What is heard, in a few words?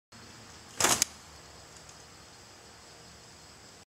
hand fan